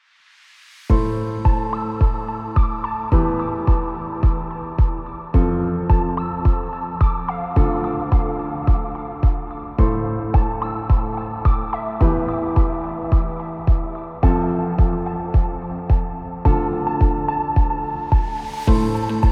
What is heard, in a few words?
calm ambient